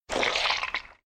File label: hideout collect glue